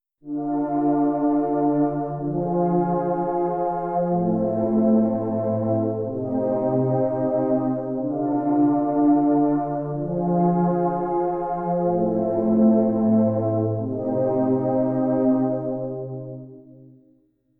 Influx Pad

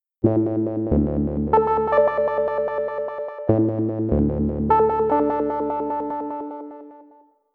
Bubbling Synth

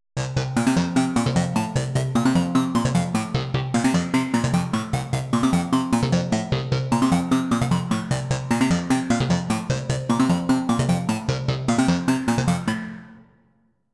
Blip

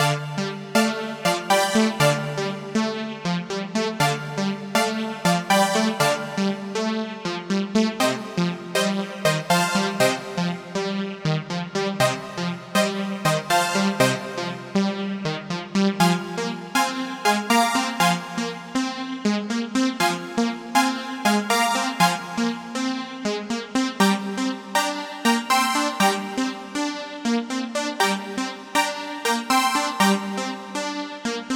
Bright Tremolo

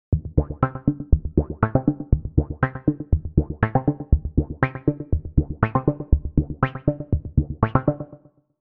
Juno Blips, Roland JU-06; SoundDiviner Project; Roland JU-06 Pack10

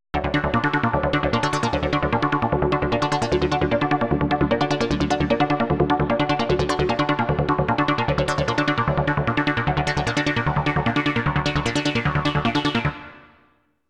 Blip

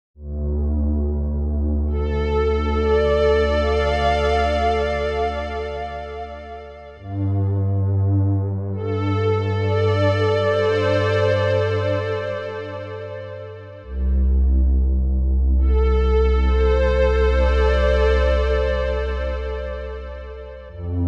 Ambient Strings, Roland JU-06; SoundDiviner Project; Roland JU-06 Pack04

